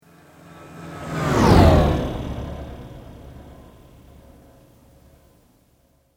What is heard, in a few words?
Sweep Mechanical Slow